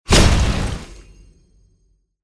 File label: fire; ludo